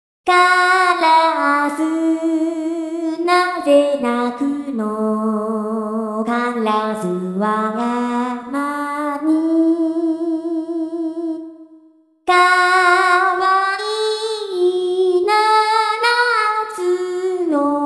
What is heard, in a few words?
seven children voice